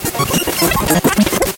radio Tuner Oct Up; hotline2

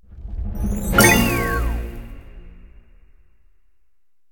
candy Win Reveal High; fairyhansel

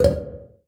respin Symbol Highlight; shangrila

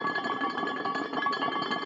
scatter mechanism rumble loop; lost relics

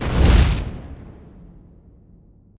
fire Wild Spread Anim; elements

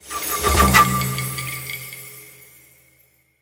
win Small; temple of nudges

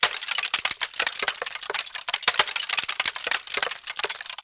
symbol freeze; shangrila

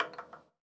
ball End, roulette; advanced-roulette

